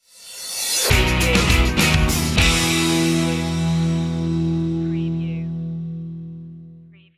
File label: free and easy stinger